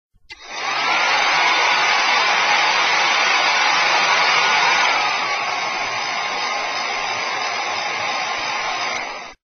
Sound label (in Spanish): Sonido De Blower